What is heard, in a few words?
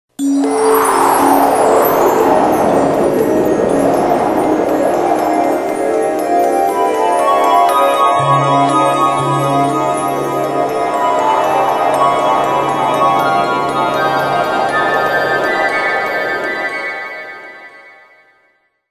Ring